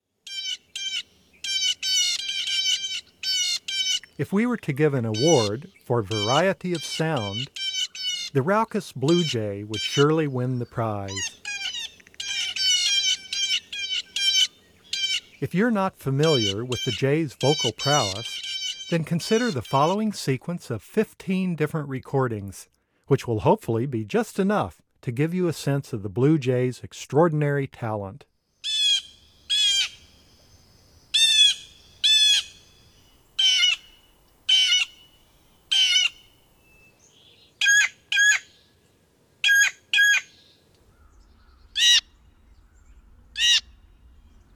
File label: Blue Jay, Bird Sounds; North America; Common Western Species; Know Your Bird Sounds